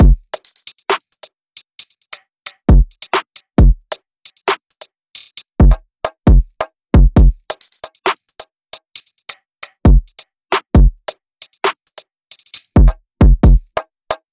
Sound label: Afrodrill drumloop drums loops 134BPM; Afroplug